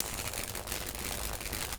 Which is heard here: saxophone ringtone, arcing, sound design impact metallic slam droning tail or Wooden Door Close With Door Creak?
arcing